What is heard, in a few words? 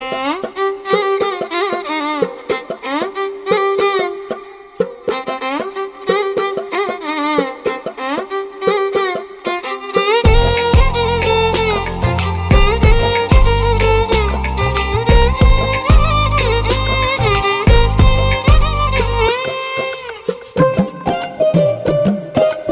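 Violin background music Telugu Ringtone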